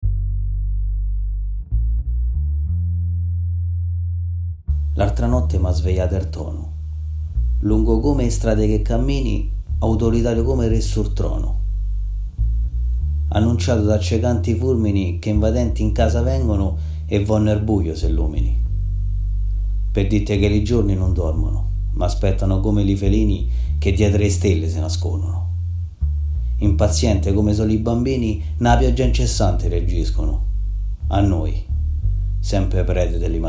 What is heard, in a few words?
song